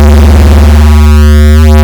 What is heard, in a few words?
fartiv; OSWorkshop